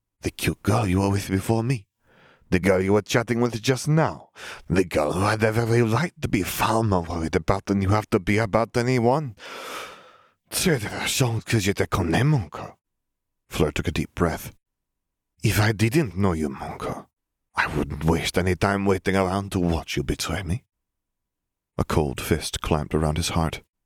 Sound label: deep breath